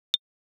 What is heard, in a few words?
tr-8 rim shot hi pitch